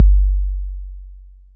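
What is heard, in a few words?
808 bd, bass drum, drum machines; 808 Extended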